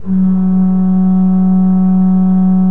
F2, Cathedral Organ, KOPPELFLUT; Propeller Island

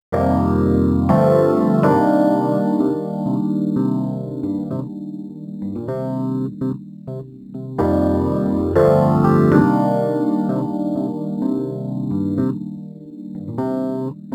Rhodes Attacks; Ueberschall

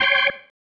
Stab, Organ, One Shots

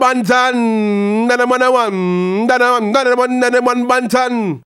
HAL RAGGAM, RAGGA-MUFF A maj

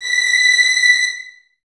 Violins B 6, String Ensembles; Miroslav Vitous; LINKS ORCH

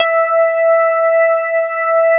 METAL B

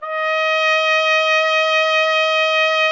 BRS TP, TRUMPETS; Roland - LA Composer vol. 1

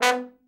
trombone; Best Service; Killer Horns

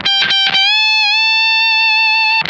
BIG LICK, Funk Guitar; Zero G - Funk Guitar

